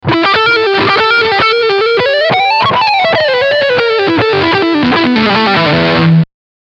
Leadsound Front Pickup

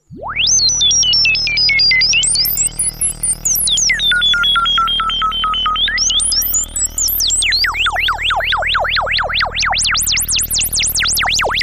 lfo resonance

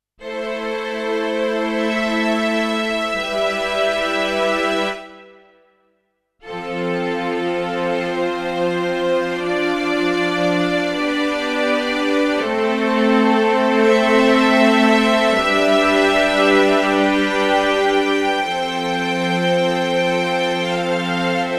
Yamaha P S500 Real Strings

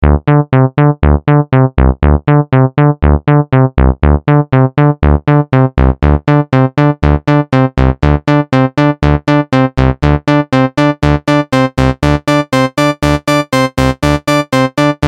Harmonizer

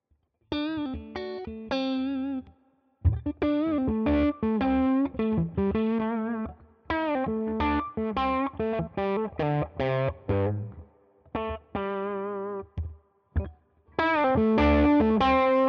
Licks LoGain Cutoff

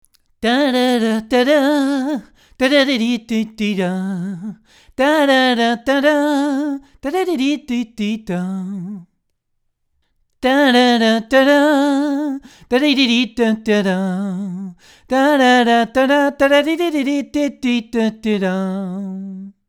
GAP Vox

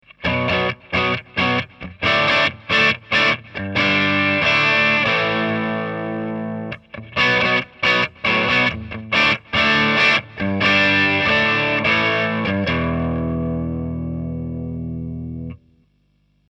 gain 12h prs

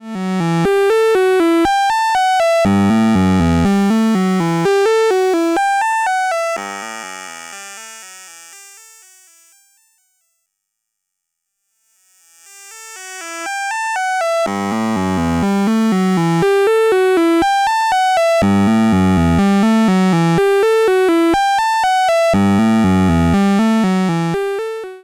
vcf1 Drive HP sweep Gain 50

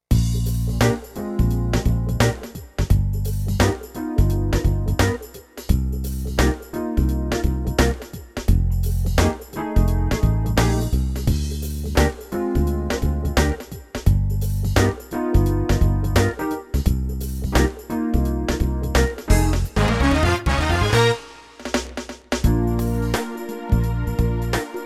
Korg EK50 Style Groove Funk

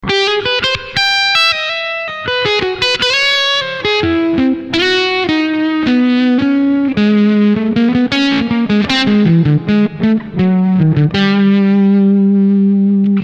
concorde soft lead

